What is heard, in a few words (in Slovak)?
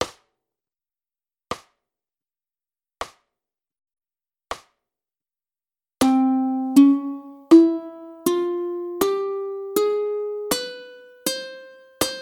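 ukulele stupnice ciganska dur 40 60 80 bpm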